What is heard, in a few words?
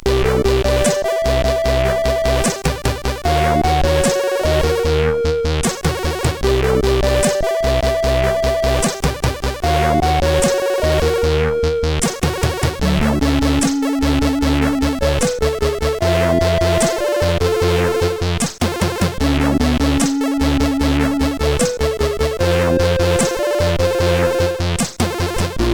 sid, CSG8580; Old Hiscore